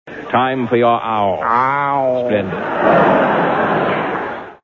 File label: owwwww